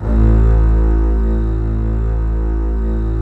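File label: C BASS A1 left; SOLO C. BASS; Greytsounds VOL-1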